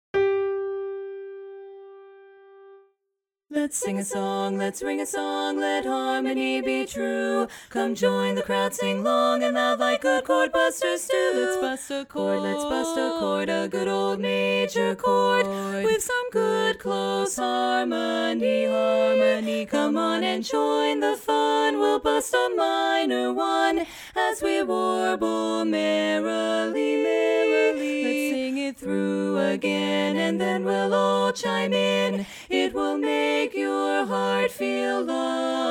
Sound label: bari missing